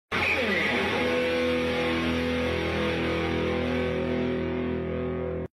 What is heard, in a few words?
Guitar Sound Effect